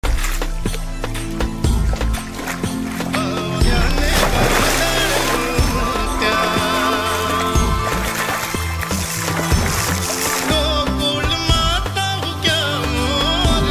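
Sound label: background music ringtone, tamil ringtone; Mani rathnam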